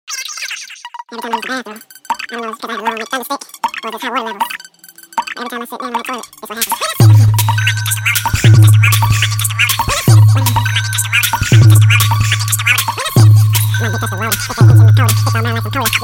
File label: splash